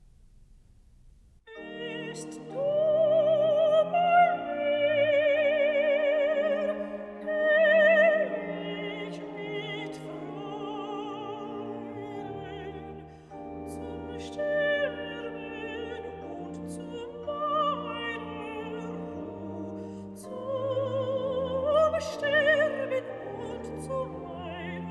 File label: aria arranged for voice & continuo; Bach; Bist du bei mir